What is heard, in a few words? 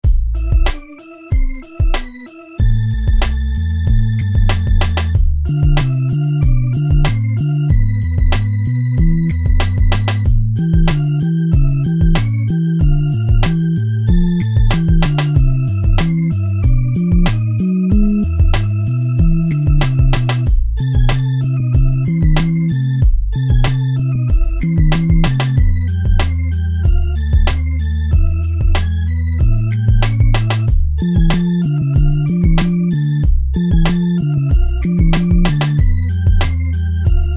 Castle hiphop; Dragon Warrior